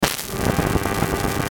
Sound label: Static Analog Hum Crackle; Production Element Imaging Element Accent Transition; Blastwave